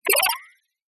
science fiction robotic cyberpunk electronic equipment beep glitch; zapsplat